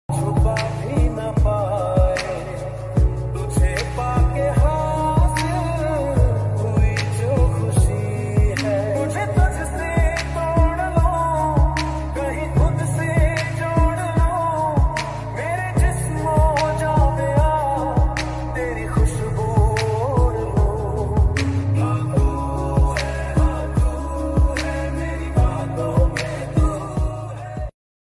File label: love ringtone, hindi song dj hindi remix ringtone